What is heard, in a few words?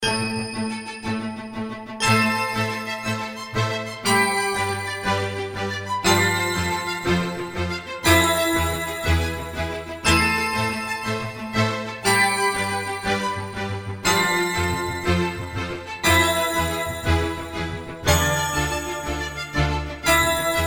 Goblin Loop